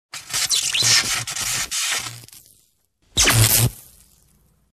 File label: electrical connection, اتصالی برق